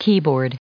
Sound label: keyboard